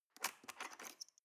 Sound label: cutlery teaspoon drawer scrape